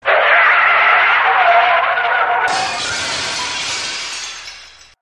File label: Car Crash